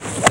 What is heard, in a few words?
divot; Computer Buyer 1997 August; Jack